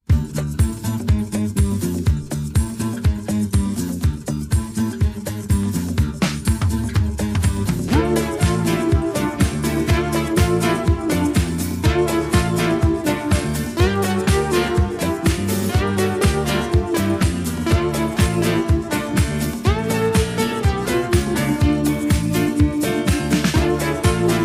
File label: orchestral